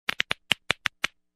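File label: sounds of knuckle cracking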